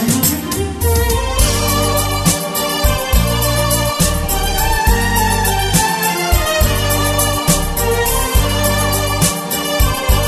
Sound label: Turkish Electro Guitar